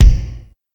ambient bass drum a sharp key, Royality free kickdrum tuned to the A# note.; kick drum samples